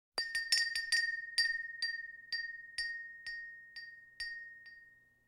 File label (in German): ladentürklingel; Auf dem Land